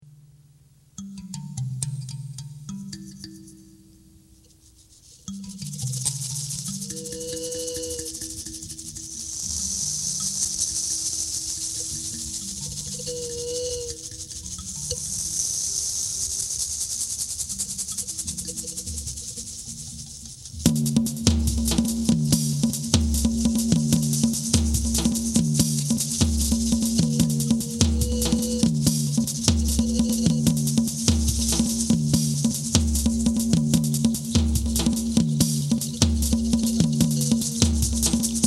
percussion; percussion works

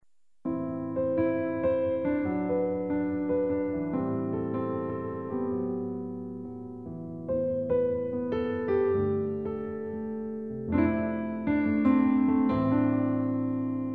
piano

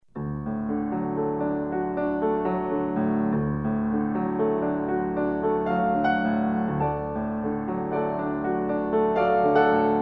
adagio 4/4; spartacus

